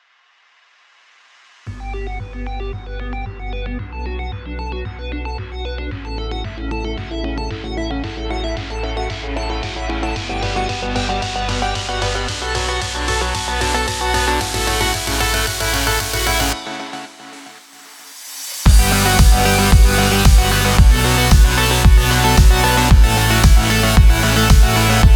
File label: hi tech and travel